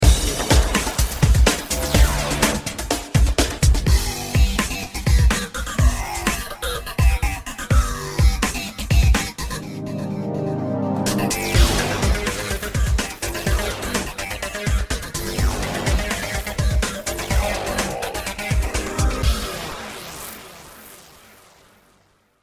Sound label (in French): intro musicale